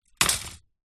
industrial tape measure plastic down on wood surface with extended metal tape; zapsplat